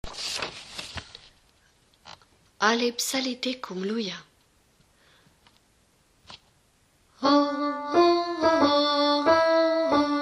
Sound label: alle psallite, basses